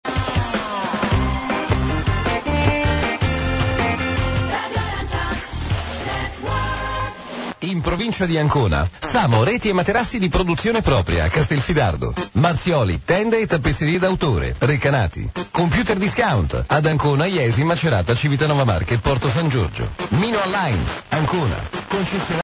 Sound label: jingle; Radio Arancia Network